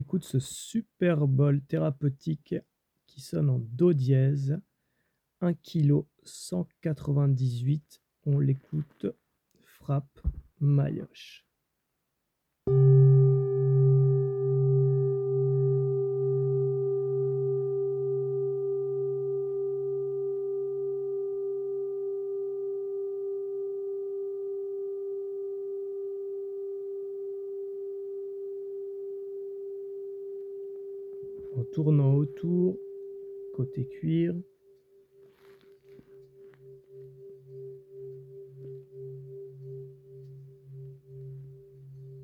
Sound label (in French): Bol Chantant Tibetain Martele Therapeutique 7 Metaux Note Do 207 mm